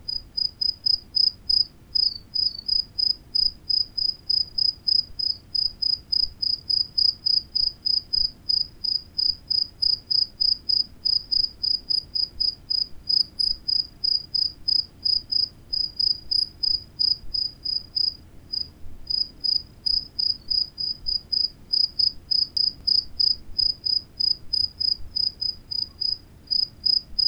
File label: animal